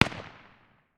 far distance, 5.56x45mm; tacz-tweak-pack; taczmechanics

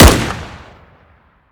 sl8 shoot; daffas arsenal; tacz

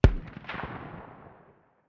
hmg m2 far distance, 12.7x99mm; tacz-tweak-pack; taczmechanics

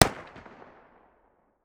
medium distance, 5.45x39mm; tacz-tweak-pack; taczmechanics

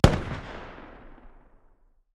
hmg m2 medium distance, 12.7x99mm; tacz-tweak-pack; taczmechanics

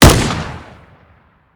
g36 shoot; daffas arsenal; tacz

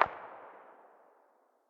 ak very far distance; tacz-tweak-pack; taczmechanics

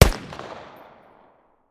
close distance, 7.62x51mm; tacz-tweak-pack; taczmechanics

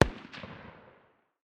ar far distance, 5.56x45mm; tacz-tweak-pack; taczmechanics

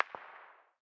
smg very far distance; tacz-tweak-pack; taczmechanics

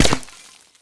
stone, hit; tacz-tweak-pack; taczmechanics

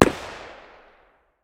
ak medium distance, 5.45x39mm; tacz-tweak-pack; taczmechanics

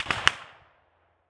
far, whizz; tacz-tweak-pack; taczmechanics

